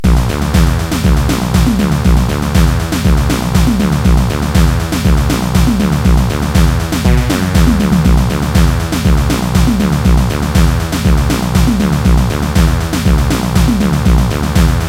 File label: bassbeat